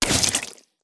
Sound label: Water Splasher; Rabbids Multiverse